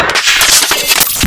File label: noise; AudibleInstruments; eurorack; elements